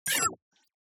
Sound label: Glitch Insect